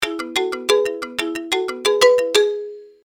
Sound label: marimba